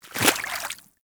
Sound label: Small Walk Water, Footsteps; Enemies; Warframe